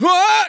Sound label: First Bail Male